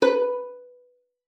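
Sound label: violin pizzicato B5; Xsample VOL-5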